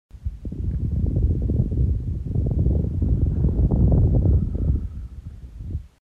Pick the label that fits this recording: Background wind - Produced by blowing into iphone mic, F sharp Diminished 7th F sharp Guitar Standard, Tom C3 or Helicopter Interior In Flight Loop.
Background wind - Produced by blowing into iphone mic